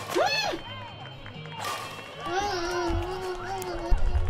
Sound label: badminton noises